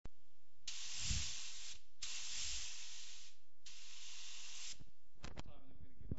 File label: spray; paintbooth